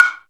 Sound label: ohkawa, Ethnic Percussion